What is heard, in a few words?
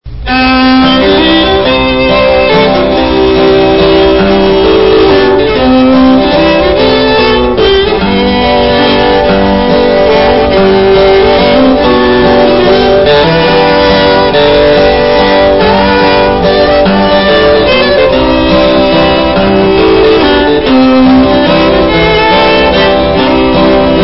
Waltz